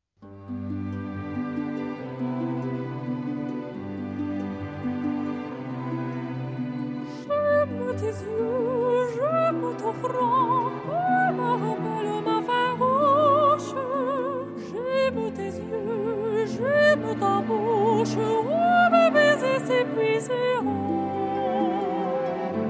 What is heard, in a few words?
soprano, Symphony Orchestra; London Symphony Orchestra